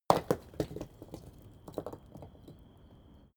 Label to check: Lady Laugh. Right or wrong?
Wrong